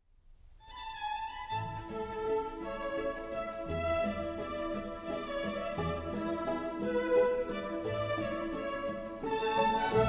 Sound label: String Quintet in E Major, Minuetto; G. 275